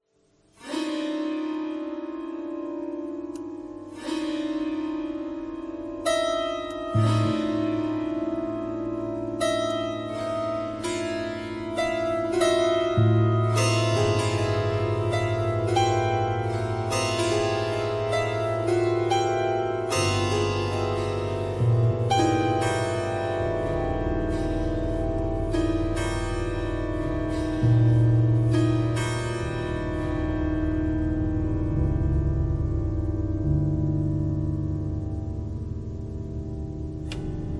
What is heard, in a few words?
piano noise